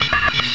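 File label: metal bong; Total Chaos Nukem 3d Pack; duke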